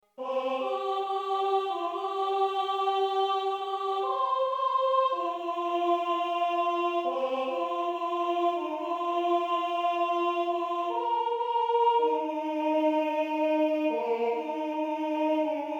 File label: ukrainian alleluia